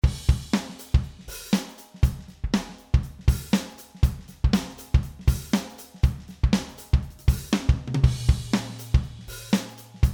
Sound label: Drums Dry